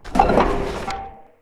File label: reload start